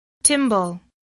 timbal

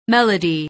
melody

